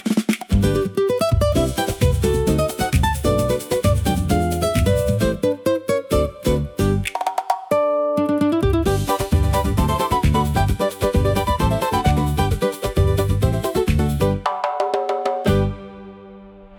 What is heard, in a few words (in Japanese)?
minna de waiwai pappa call long; free jingles